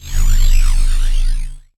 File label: Scifi Synth Tone Disable; Energy & Forcefields